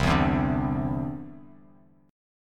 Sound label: C-Major Add 9th, Bass Standard; strummed